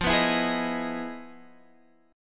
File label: E Major 7th F, chord; strummed; Emaj7/F; JGuitar